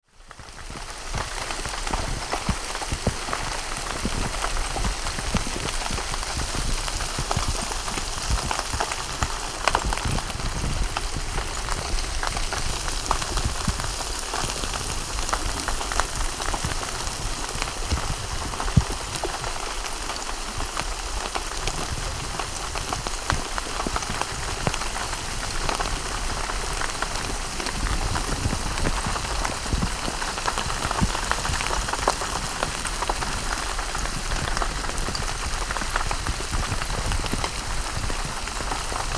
aaresteine reise knechtenloch

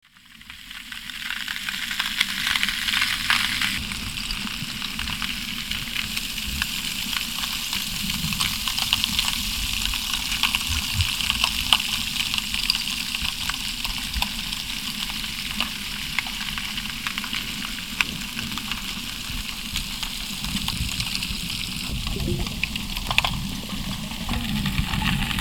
aaresteine ungefiltert

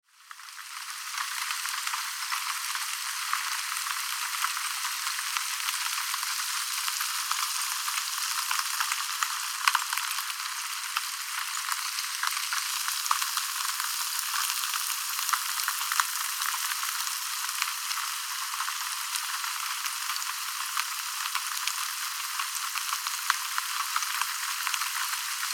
aaresteine reise knechtenloch, aare

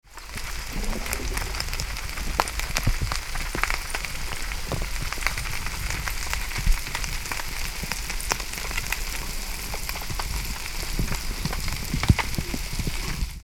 aaresteine reise dampfzentrale, aare

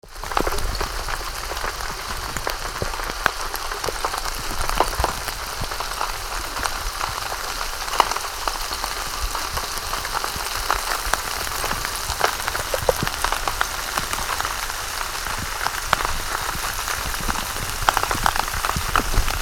aaresteine reise tierpark

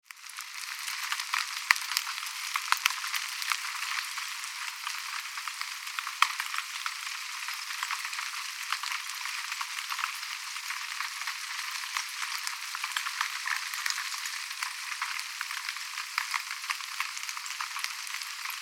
aaresteine reise schoenausteg filter

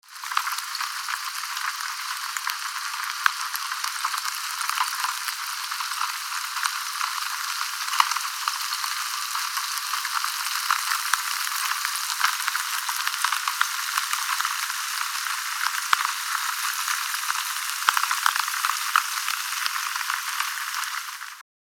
aaresteine reise tierpark, aare

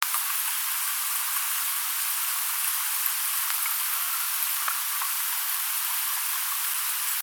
aaresteine marzili

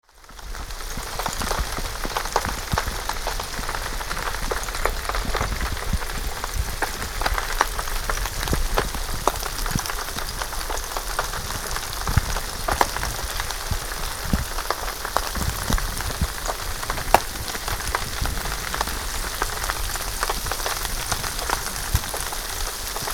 aaresteine reise eichholz

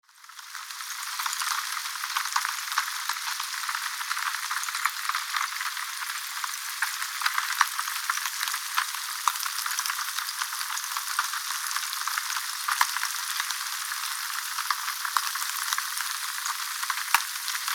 aaresteine reise eichholz, aare

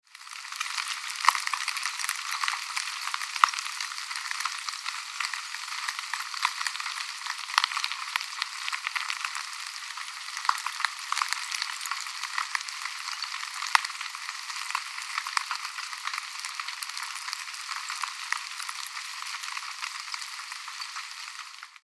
aaresteine reise kanal filter

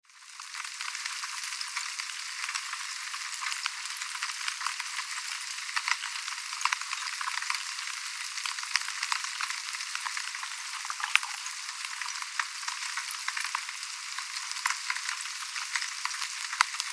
aaresteine reise monbijou filter

